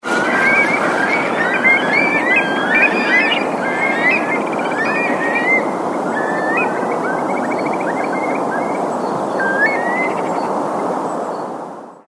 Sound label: curlews orwell